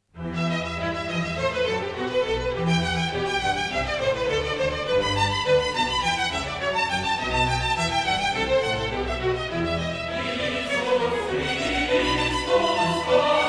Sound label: Verse 3 (chorus tenor); Cantatas; Bach; Famous Cantatas II